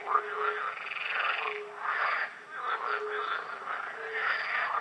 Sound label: Small ambient frogs; STALZONE